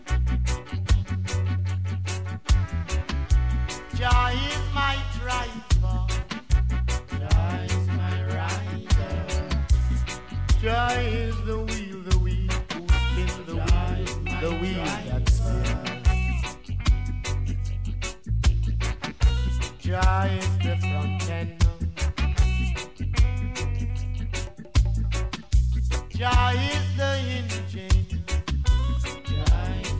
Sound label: reggae